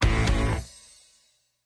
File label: generic death sting